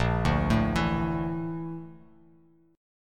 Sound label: Bb Major 7th Flat 5th Bb Bass Standard; BbM7b5 arpeggiated; JGuitar